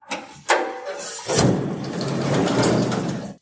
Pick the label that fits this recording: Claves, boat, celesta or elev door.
elev door